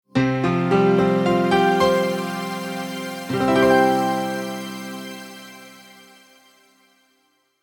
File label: multimedia logo, Musical Identity/Signature